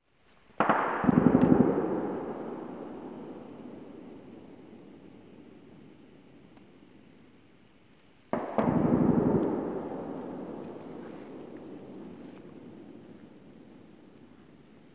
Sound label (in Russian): стрельба